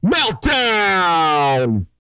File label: vocal, hip-hop; R&B Dance; TB HIP-HOP